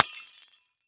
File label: Impact Light Bulb Smash; Impacts